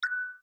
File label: player action